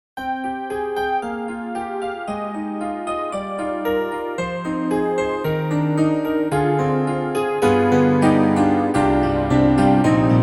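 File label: YAMAHA MOTIF-RACK XS 80s Layer, piano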